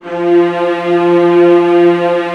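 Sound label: VIOLAS G3, Strings; ILIO; Synclavier Strings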